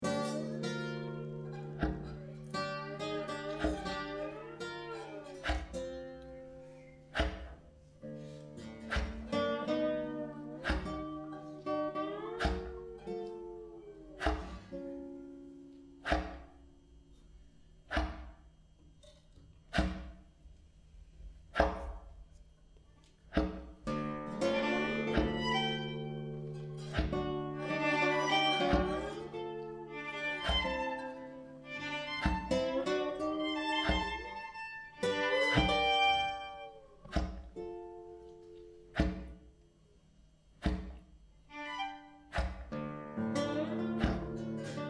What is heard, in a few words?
break; kuhflecken